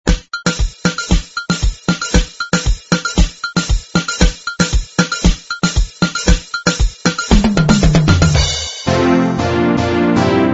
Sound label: soca steelpan